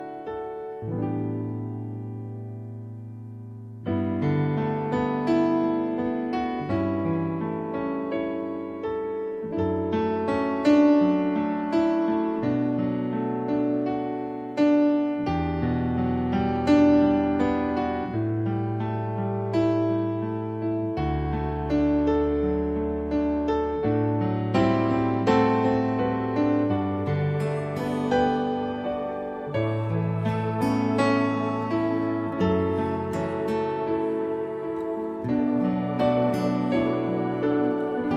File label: music, A professional mix showcasing dynamic range and balanced frequencies.